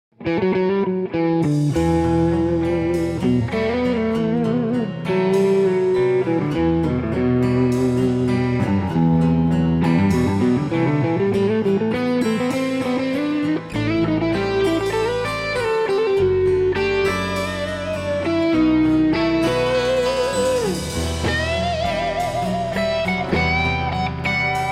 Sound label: Heritage H150 ThroBak SLE 101 MXV set